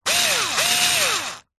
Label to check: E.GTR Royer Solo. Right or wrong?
Wrong